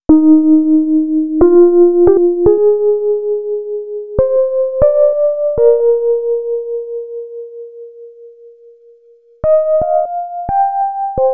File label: High Fills, Electro Lounge; Sonic Foundry (Sony Creative Software); 086 Huggybear